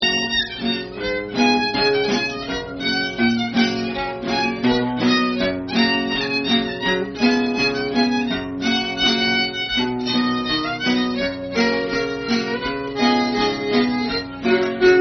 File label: Heel And Toe Polka, Jenny Lind Polka; Bigford